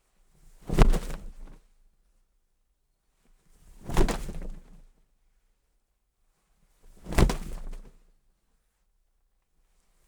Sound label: wings flap